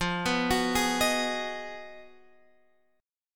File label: B 6th F, guitar chord; B6/F; arpeggiated